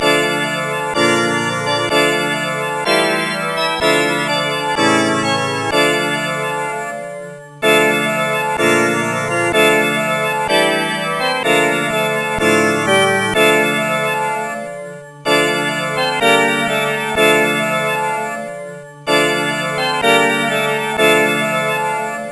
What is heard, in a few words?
death tune; MED